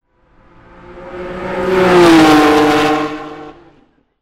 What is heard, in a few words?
CARS